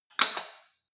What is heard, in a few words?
weight rack insert weight multiple short